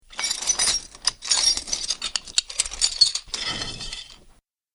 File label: Metal Chain Sounds Impact; Miscellaneous Impact Sound Effects; Clink, Clatter, Clang, And Clink Are The Sounds That These Chains Make.; Andrew Fleming